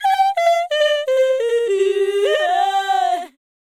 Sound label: Fem Vocal 128bpm G FX, African Voices; Quazibeat; African Secret; Female FX